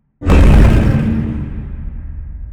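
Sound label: pile distant, construction; ambient; pile samples; Half Life Alyx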